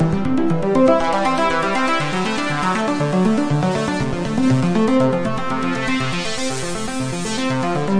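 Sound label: 120 bpm happy filter apreggio fx, synth and electronic loops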